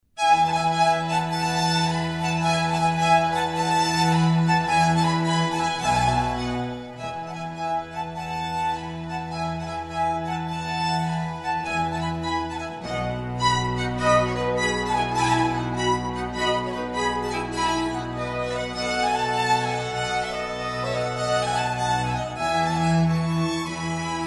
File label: concerto violin string; vivaldi